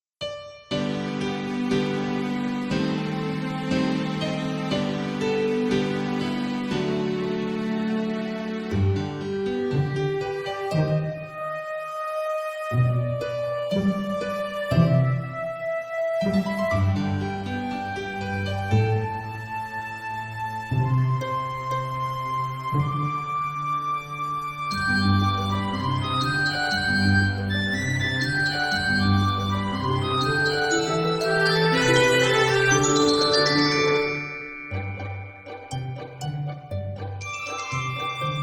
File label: Failure Success I wasn't Prepared for This (Instrumental)